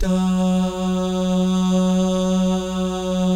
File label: DAH, Vocal Jazz; ILIO